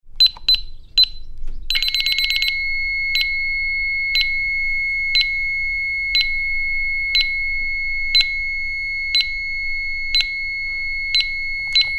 Burglar Alarm - Programmed and set - domestic alarm; Alarms; Electronics; Tom Hutchings